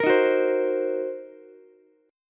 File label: C Minor 7th Flat 5th C down Ukulele; Cm7b5 strummed; JGuitar